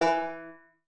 Banjo Note; Bendy: Lone Wolf

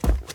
Step Hard Surface

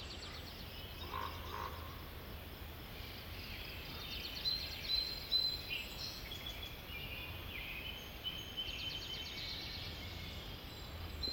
Ambience Big Tree

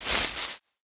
Leaf

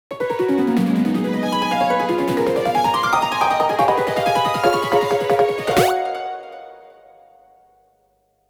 Leaf Slider

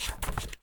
U I Passport

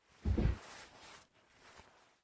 Fall; MiSide; Mita Fall

